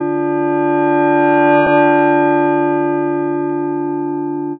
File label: bell rev; specenv